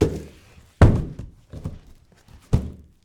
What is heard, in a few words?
Cardboard boxes